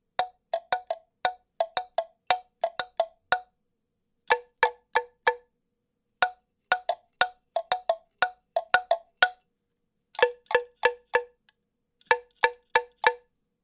caparacha de tortuga

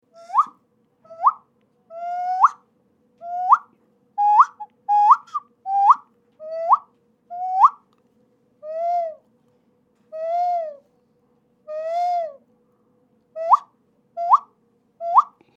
silbato nasal del amazonas

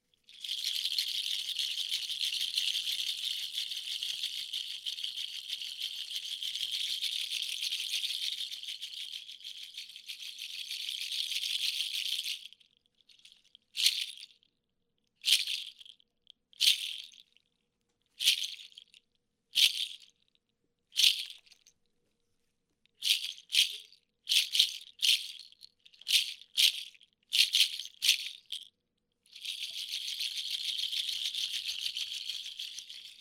sonaja de hueso de fraile o ayoyote

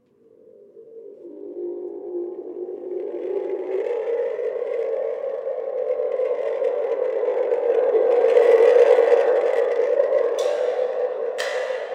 sonido de la tormenta

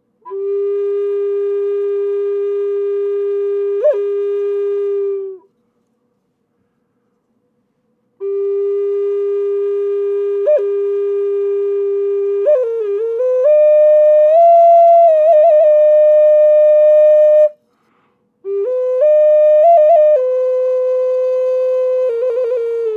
flauta de barro tubular

